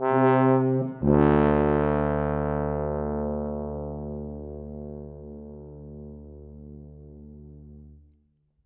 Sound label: Trombone Tuba, Orchestral; Sonic Foundry (Sony Creative Software); Orchestral VOL-2; Modern 07